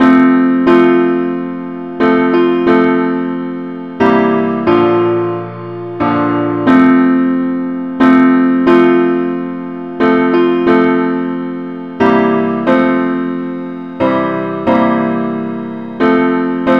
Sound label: simple acc, key f